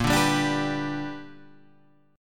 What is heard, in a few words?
B Minor Major 7th Sharp 5th, chord